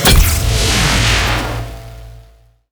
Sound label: fire orb attack main; skbt cameraman titan